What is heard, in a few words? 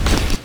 Generic Vault, Generic Foley; Warframe; Characters